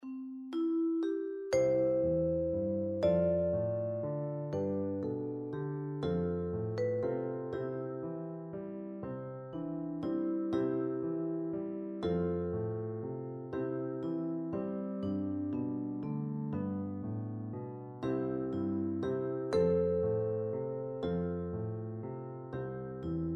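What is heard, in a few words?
vibraphone, percussion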